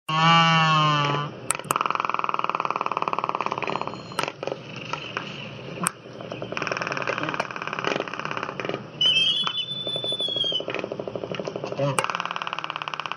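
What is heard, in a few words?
albatros, звук альбатроса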